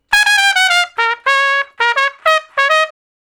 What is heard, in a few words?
Trumpet Shuffle (Bb); Solos